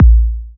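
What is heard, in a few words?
Click; Cick Pack 1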